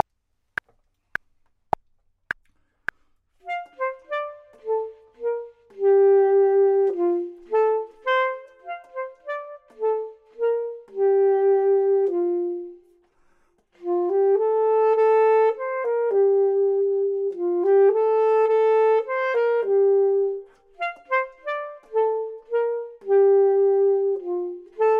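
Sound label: top, Saxophone and Clarinet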